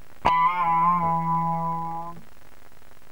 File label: bass